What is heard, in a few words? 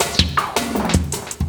Loop, Drum & Bass, 160 BPM; Ueberschall; Drum & Bass Flash Trackz